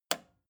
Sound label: record player repeat jump out push